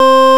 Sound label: VOX, VOX ORGANS; Greytsounds VOL-1